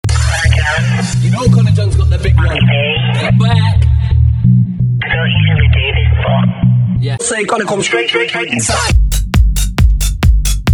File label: techno; Remastered Remixed